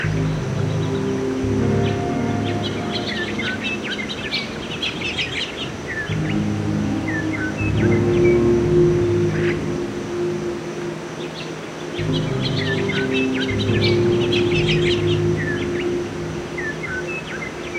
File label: Piano Ambience